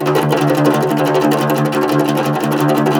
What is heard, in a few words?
CUMBUS TR, Cumbus Tremolo